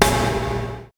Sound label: Snare, Percussion rock; Giga Samples Collection; ELQ-DrumQit; rock toms